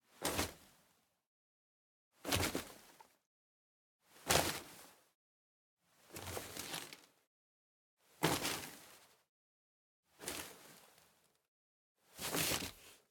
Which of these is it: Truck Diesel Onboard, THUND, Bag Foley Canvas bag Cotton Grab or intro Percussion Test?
Bag Foley Canvas bag Cotton Grab